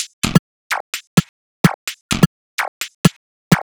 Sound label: perc 128, Electro percussion loops; Anthemic